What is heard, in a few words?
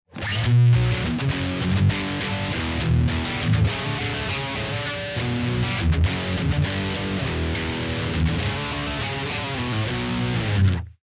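EMG SA bridge dirty, ElecGtr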